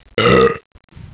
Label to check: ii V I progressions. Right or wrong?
Wrong